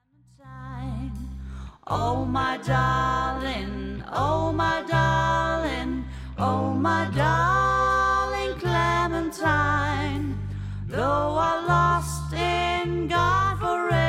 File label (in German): ohne ukulele; oh my darling clementine